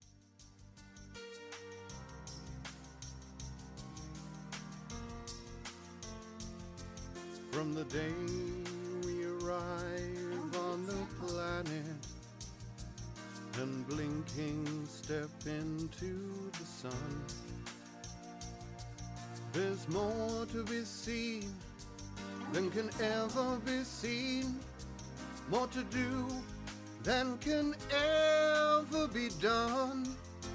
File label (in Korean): 영국남 노래